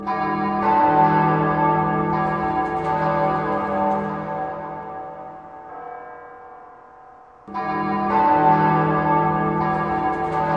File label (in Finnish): kirkonkello